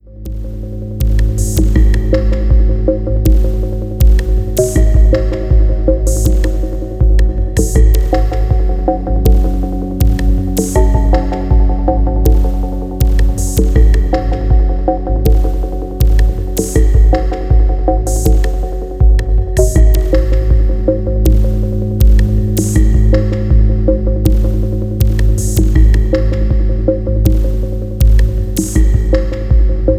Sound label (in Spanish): Ritmo Elegante